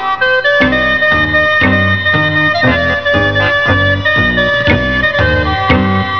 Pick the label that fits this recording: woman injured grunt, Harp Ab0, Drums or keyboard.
keyboard